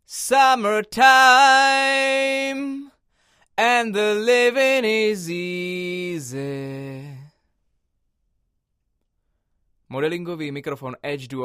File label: Vocal - C414 Card - Edge modelled; AKG C414; cardioid (kardioida); edge duo srovnavaci test